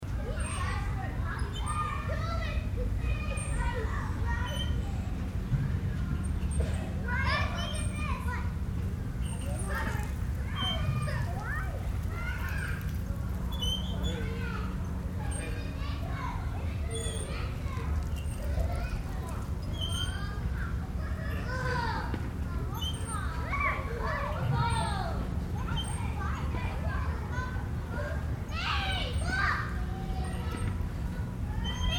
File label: Playground Kids Playing Long, Children playing with swings; Children and parents talking, distant city no; Playground Sound Effects